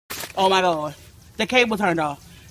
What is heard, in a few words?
the cable turned off